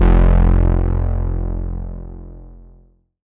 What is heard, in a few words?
Bass, 2600 F1, Synths, ARP 2600